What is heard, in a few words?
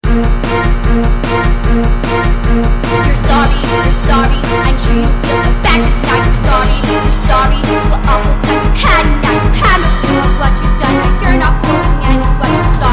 vocal